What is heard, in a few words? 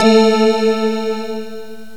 brightness, synth sfx; Software Explosion 2 - Virtual Computer Pets; yamaha xg